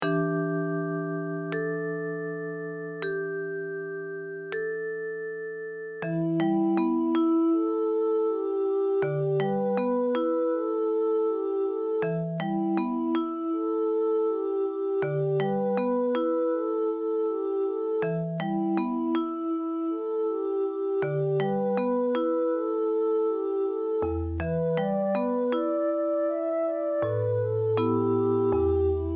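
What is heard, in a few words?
children's songs vocal & guitar; 4 children's songs; akai setta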